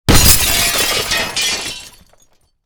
Glass Breaking; zoomerang

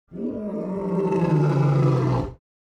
groan; keepers-kingdom

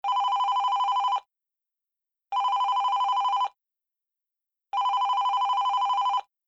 cellphone; time-lab

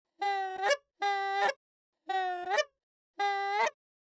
goose honks; emerald-crossing

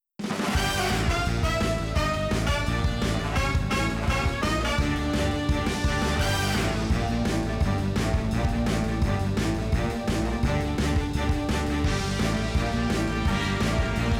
Opening Theme Music; ocean-commotion

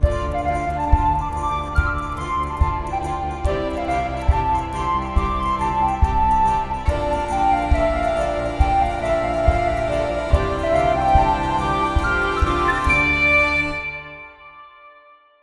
theme music; emerald-crossing

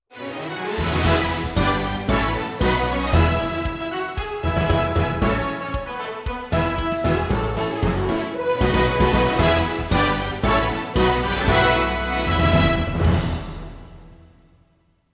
theme music; wonder-junction

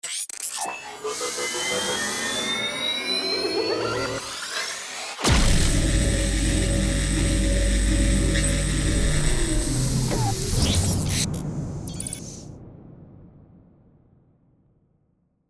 time machine; time-lab

